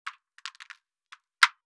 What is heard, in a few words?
drop back, highpass, large styrofoam long thin foam roller